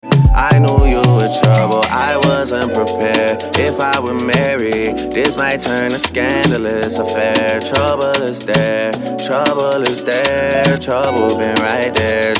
Droning

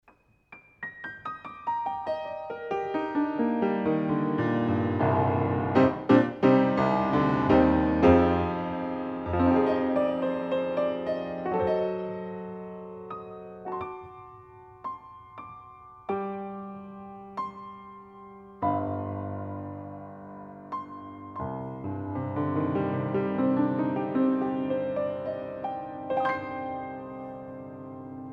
Piano, 60cm Cardioid Pair Lid Off

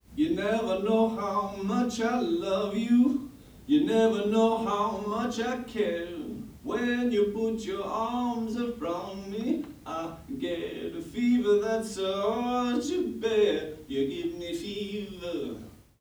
Resonators Vocal Double Glazed Window Stereo

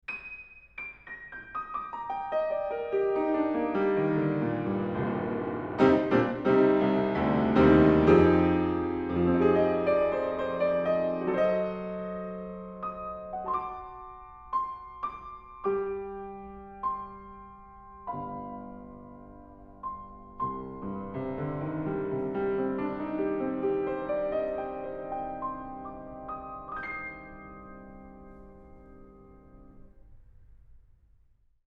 Piano, Horiz Half Open Mic

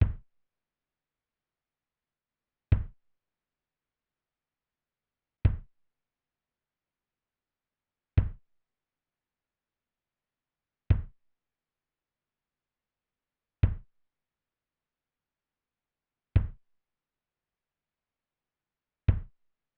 Kick Main

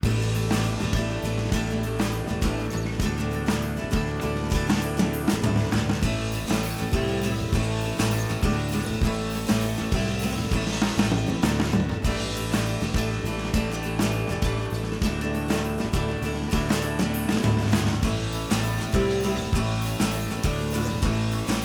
Bass Double

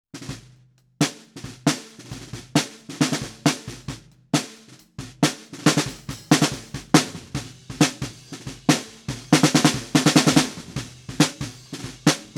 Snare Shoot Snr Bottom SM57

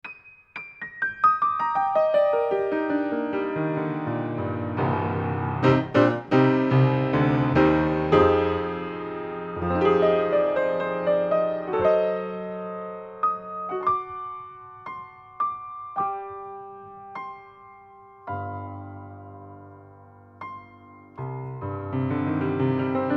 Piano, Inside Card Pair

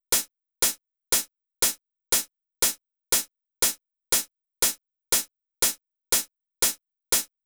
Hihat Sample Solo

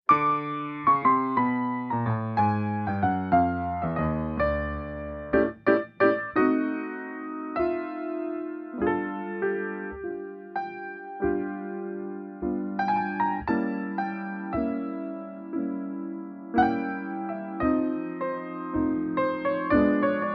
Piano Two Mics Panned; Manufacturer Audio

